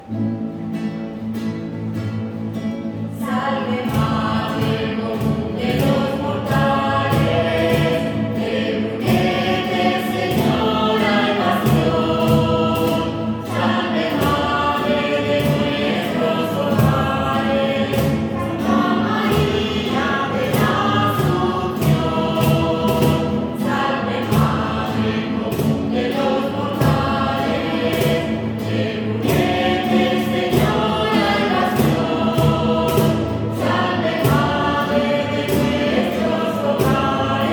HIMNO ASUNCION; la asuncion de la bienaventurada virgen maria misa 15 de agosto 2023

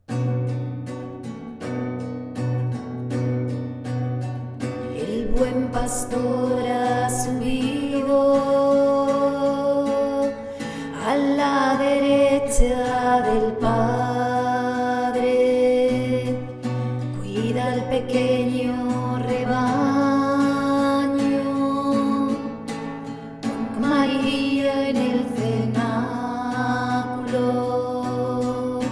HIMNO DE LA ASCENSION

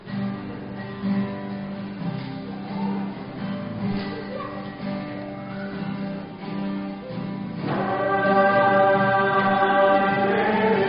SALVE REGINA ES CASTELLANO; TIEMPO ORDINARIO 2021

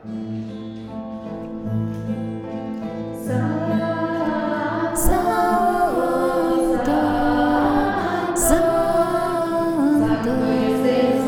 SANTO HAENDEL VOCES

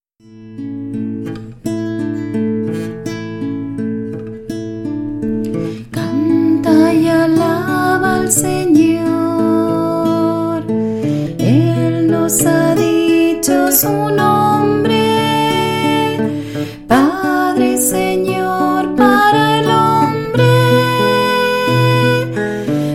HIMNO SANTA TRINIDAD

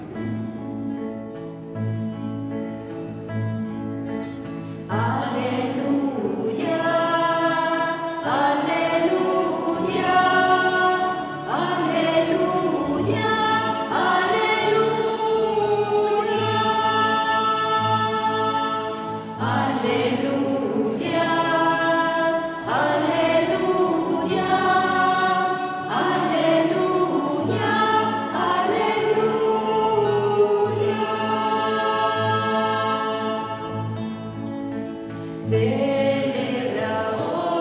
aleluya canto del angel en misa

